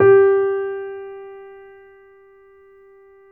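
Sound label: G4, grand piano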